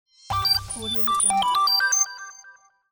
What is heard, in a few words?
hightech small logo